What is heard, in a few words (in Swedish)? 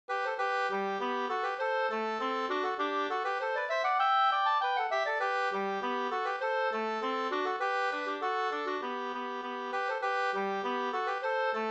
engelska, 2st